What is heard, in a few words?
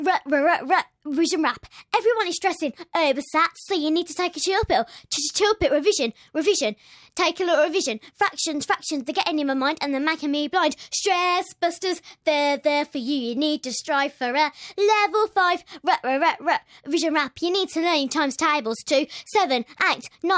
revision rap